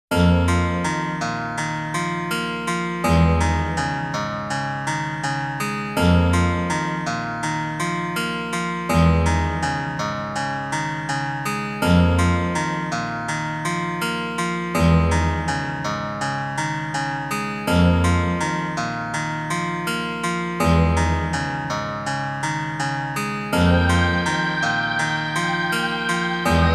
Conversation; Shin Megami Tensei IV

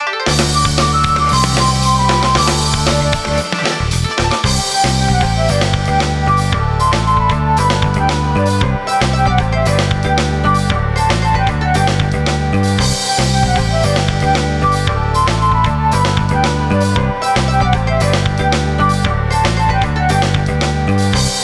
Minigame Win; Naruto Shippuden Ultimate Ninja 4

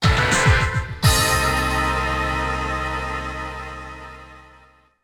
Fight; Shiritsu Justice Gakuen Nekketsu Seisyun Nikki 2; playstation

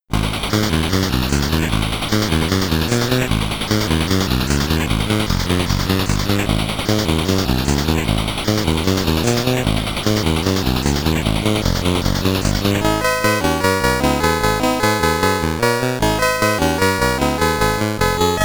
SID Stereo, commodore 64; Wizardry The Edge

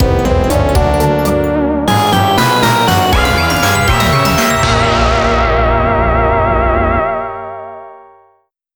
Start BGM; Castlevania: The Adventure ReBirth; Wii

